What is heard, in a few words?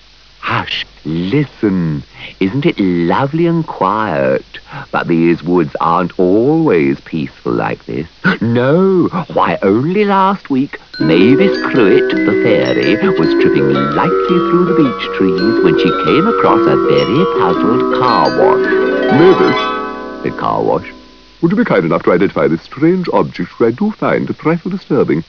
moog; classictv; willothewisp